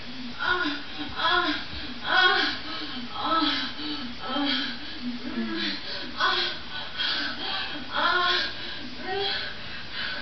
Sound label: boomy room orgasm, Silly Lo-Fi Orgasms